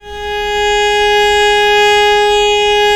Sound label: VL G#3, VIOLE, Historical Instruments; USB Soundscan vol.23